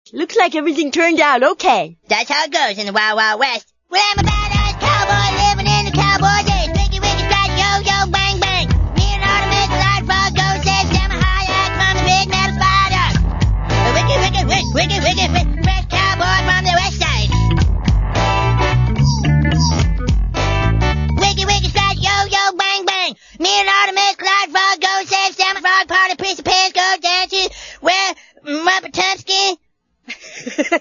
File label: rap; cartman